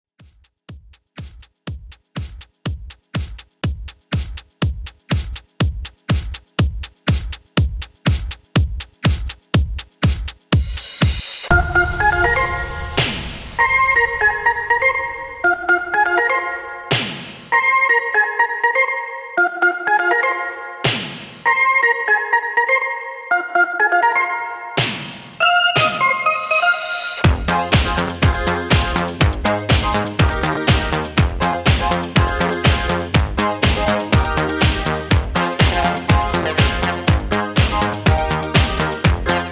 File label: 80s Redrum 122Bpm; djyan